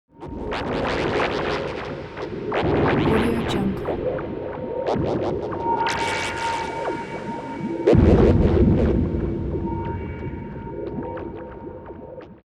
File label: science fiction space biotech alien cave